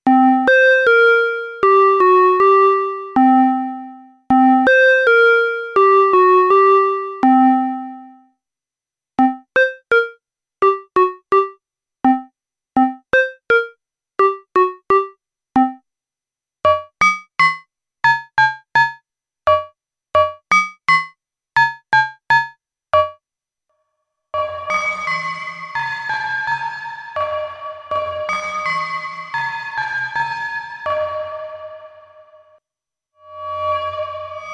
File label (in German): Modular Patches Zufallsprodukt